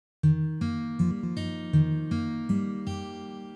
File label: Ornamentation Triplet